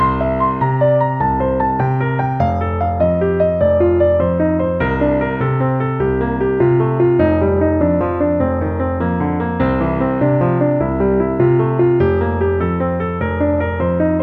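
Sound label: Exercise in Diatonic Broken 6ths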